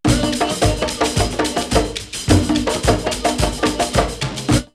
Timbuktu 108, drumloops World & Ethnic; X-Static Goldmine

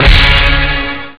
Hot Orch Hit; jazz jackrabbit 1; M8

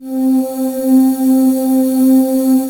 JD990, CHOR; Best Service; Alpine Volksmusik

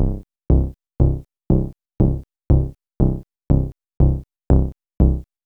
Moog bass; AMG - Vince Clarke Lucky Bastard

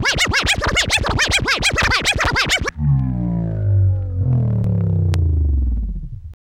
LONG SCRATCH, Vinyl Scratches

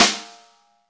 SNR, Snare; Club-50 - Foundations Roland; Ext.Snare 4

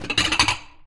DISHES, household; AKAI Anime Sound FX

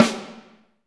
SD Real, Roland; Sound & Vision - Gigapack I

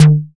FX A, ANALOG; Sound & Vision - Gigapack II

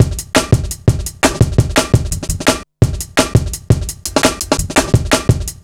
170, Drum Loops, Drum & Bass

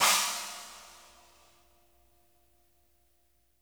DING, cymbals; Sampleheads - New York City Drumworks VOL-1; SW CYMBALS

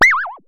Synare Filter; Star Instruments Synare 3

